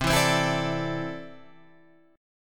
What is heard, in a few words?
C 6th Add 9th C down